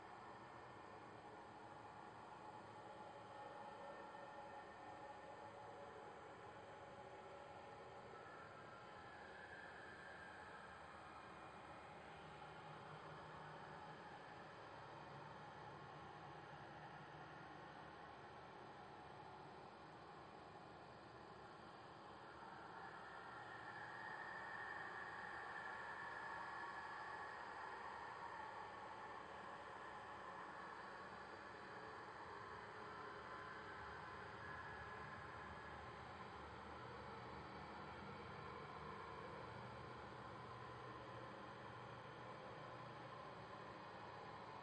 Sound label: Radiostation Library Atmosphere Loop; horror and atmosphere fx; Silent Hill Downpour